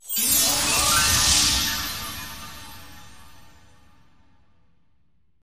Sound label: fairy Throw; fairyhansel